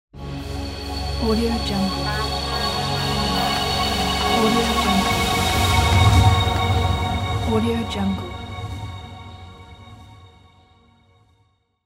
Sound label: outro logo